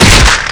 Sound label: box stab; jailbreak